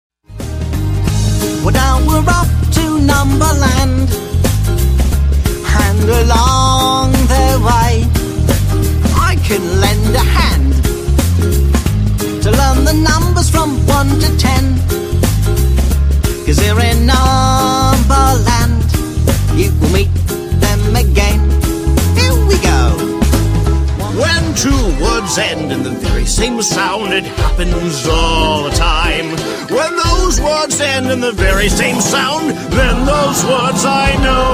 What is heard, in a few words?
Singing Characters